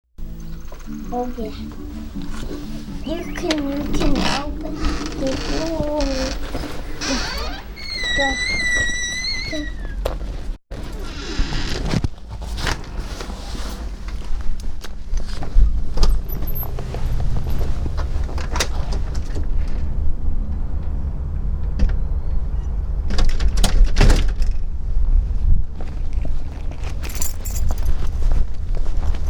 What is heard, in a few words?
Going Out to Start Car; Richland WA